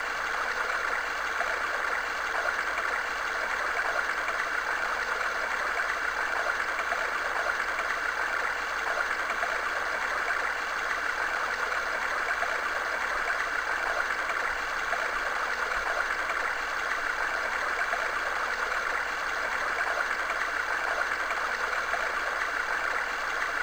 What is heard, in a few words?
stream, tinnitus maskers